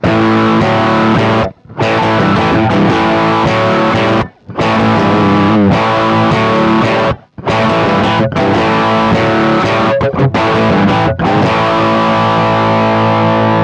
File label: bluguitar amp1